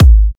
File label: Kicks G#; markus hakala